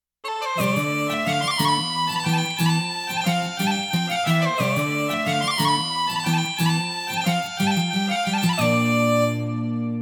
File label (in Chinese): 爱尔兰风笛铃声